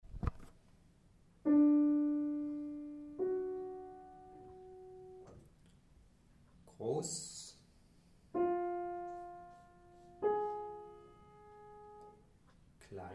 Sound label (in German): Intervalle Terzen gross klein; Gehoerbildung